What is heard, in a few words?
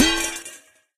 lid drop roll; video games; player; taunt; grilled gunman; source engine; tf